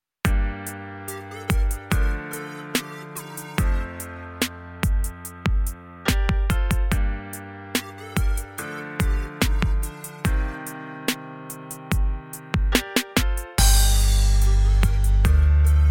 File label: Minor Trap